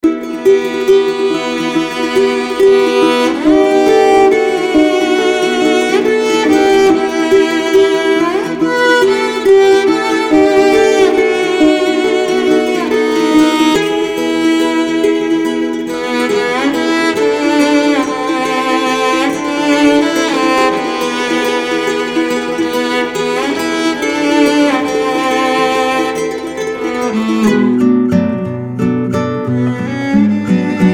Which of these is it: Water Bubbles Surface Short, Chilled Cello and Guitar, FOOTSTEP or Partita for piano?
Chilled Cello and Guitar